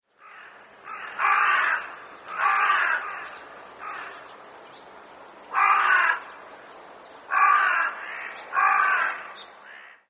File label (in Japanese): hasiboso, karasu